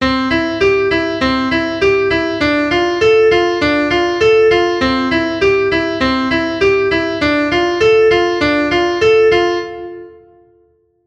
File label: Pattern C to Dm